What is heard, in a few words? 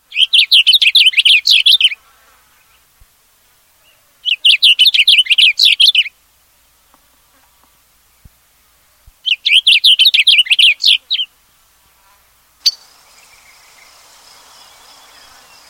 blue grosbeak; Spring